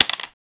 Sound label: board game